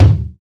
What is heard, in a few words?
focused kick single hit A key, kick drum one shot tuned to the A note; kick drum samples